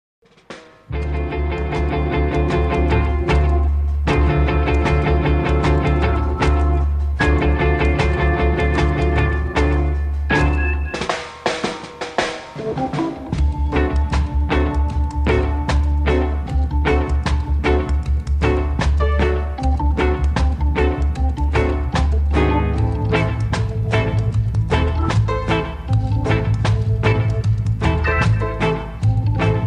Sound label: Ghetto Organ